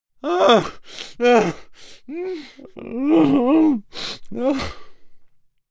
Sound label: Deep male crying